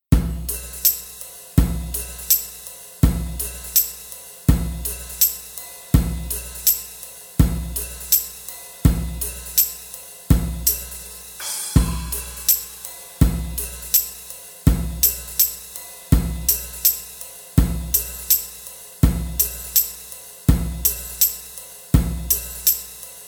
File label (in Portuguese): NESTA RUA - RITMO